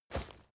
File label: step leaves wet run heavy single; schritte